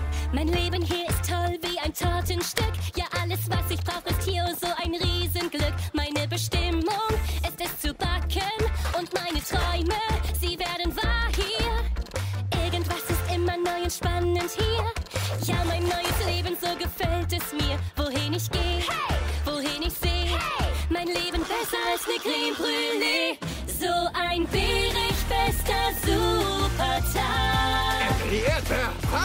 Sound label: Song